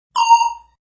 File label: Zinger; Zingers; Multimedia